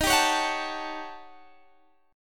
Eb 7th Sharp 9th Flat 5th, Eb, Guitar, Half Step Down